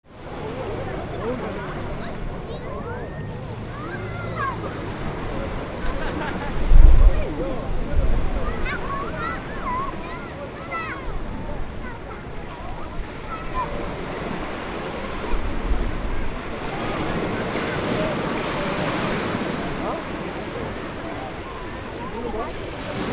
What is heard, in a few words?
Beach ambience families kids playing and waves